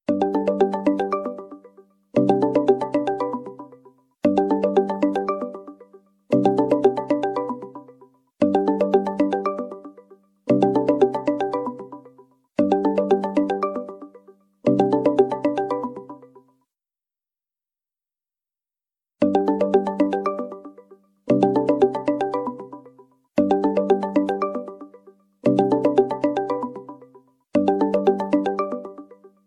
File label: vivo, ringtone